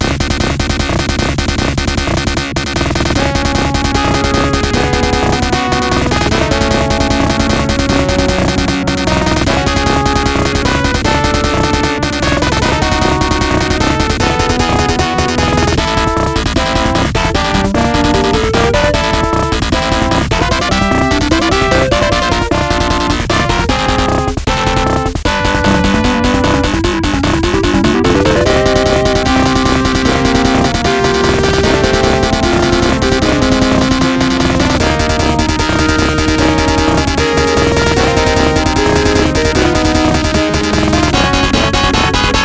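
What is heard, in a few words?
background music; Bionic Commando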